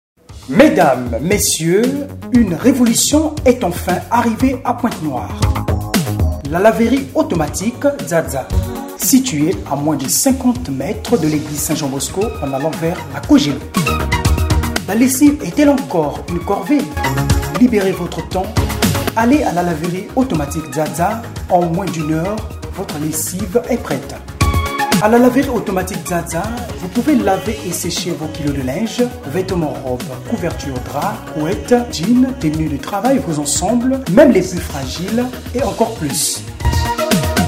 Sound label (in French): Jingle Laverie; Pointe-noire